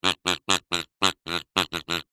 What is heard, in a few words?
cartoon duck quack